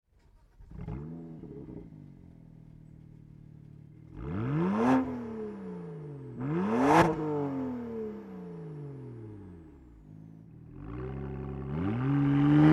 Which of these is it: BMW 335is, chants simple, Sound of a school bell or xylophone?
BMW 335is